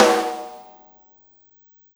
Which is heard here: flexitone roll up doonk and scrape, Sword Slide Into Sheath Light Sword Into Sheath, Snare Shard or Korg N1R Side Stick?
Snare Shard